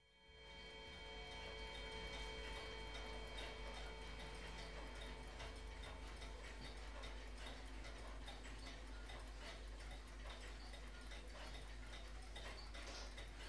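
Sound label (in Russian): магазин часов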